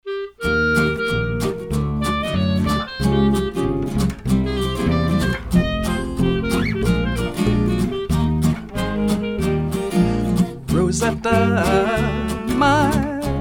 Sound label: tin pan alley quintet